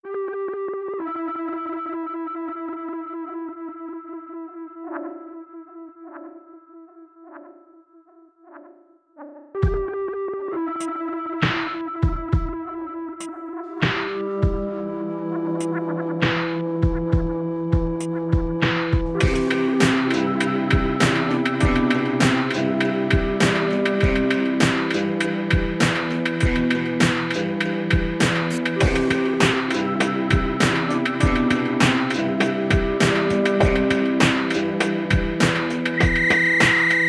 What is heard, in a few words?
electro; BirdyClip